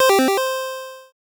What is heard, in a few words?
stream alerts; popskyy; stream alerts pack; Solantis1